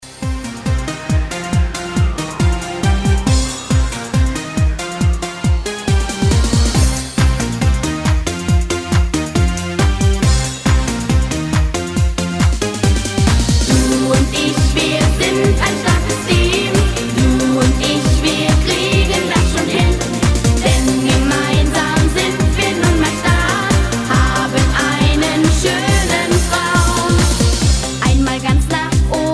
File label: deutsch pop